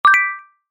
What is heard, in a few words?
open chat